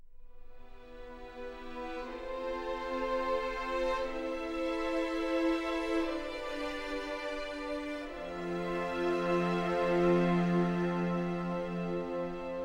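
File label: Explore Overworld Stinger 120 E minor 4/4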